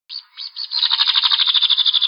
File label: Popokotea Whitehead